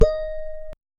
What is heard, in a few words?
a clean dry audio recordi, melody